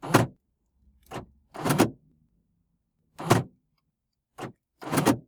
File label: central locking Ford Mondeo Mk 3